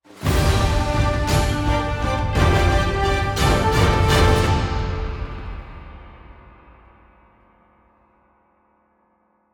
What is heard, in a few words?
Transition